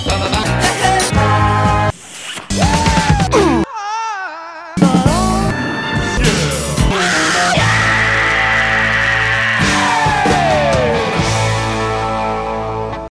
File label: grunts